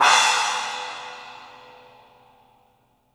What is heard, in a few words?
CYMBALS; 3D Sound; 3D Drums